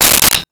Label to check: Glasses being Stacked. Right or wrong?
Right